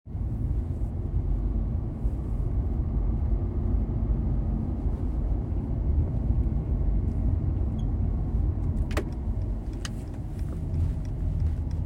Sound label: squeaking noise squeaking sound; c-class w205